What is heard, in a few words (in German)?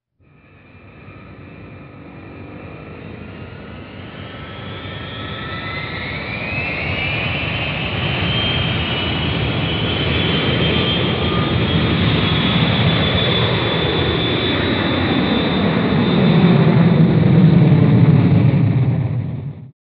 sms toene kampfjet